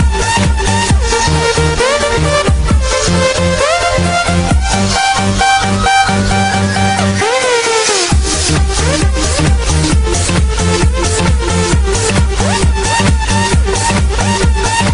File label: Techno Remix